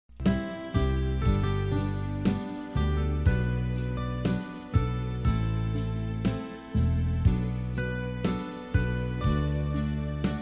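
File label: soprano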